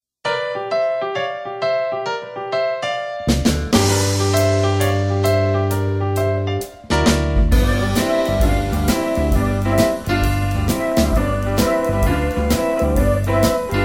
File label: Instrumental; New Testament